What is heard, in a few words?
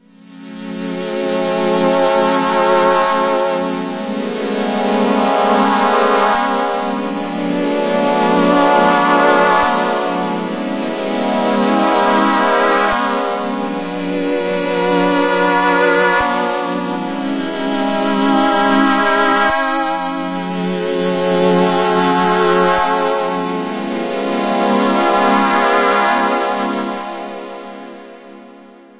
string fm, yamaha tx81z